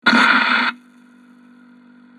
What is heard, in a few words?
open